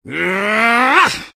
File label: taunt exert; engy; tf; taunts